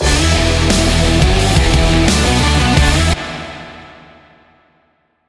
guitar; axel nosferatu

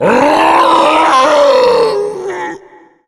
tank death, zombie; Left 4 Fortress

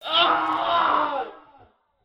scream

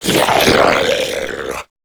zombie vox male runner run front; rave in the redwoods

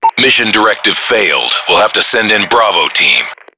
lose; payday

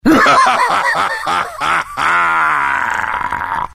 laugh; freak fortress 2; gangplank

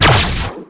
pomson fire

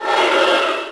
breadmonster idle; tf2pets

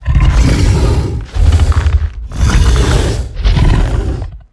queen recharge; Attack of the Radioactive Thing

